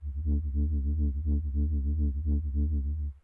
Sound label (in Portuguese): baixo desafinado; lazykey